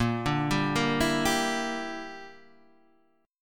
A sharp 6th Flat 5th, Guitar Standard; A#6b5 arpeggiated; JGuitar

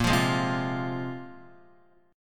Bb 7th Suspended 2nd Bb, Guitar 4E 4A 5D 5G 5C 6F; Bb7sus2; strummed; x,1,3,1,0,0; JGuitar

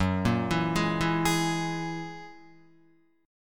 Gb 9th Gb down Guitar 4F,4A,5C,5G,5C,6E; Gb9 arpeggiated

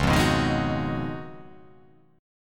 Db 11th, chord; strummed; JGuitar

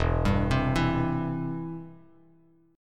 E Minor Sharp 5th E down Bass Standard; Em#5 arpeggiated; JGuitar

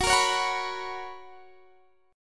B Major 7th Suspended 2nd, chord

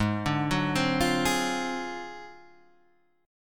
D 7th Sharp 9th Flat 5th G sharp

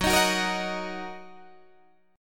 Fsharp 6th down Guitar Open D

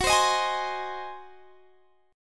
Ab 7th Gb

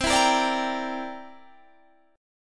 C 9th C down Guitar Open D

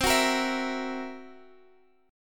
F sharp 7th Flat 5th C

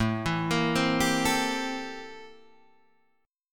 A-Minor 7th Flat 9th, chord; A-7b9 Chord {5 7 8 5 8 5} arpeggiated

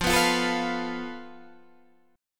F Major Flat 5th, Guitar, DADGAD

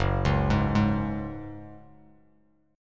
Gb Suspended 2nd, Bass Standard; arpeggiated; 2,4,6,1

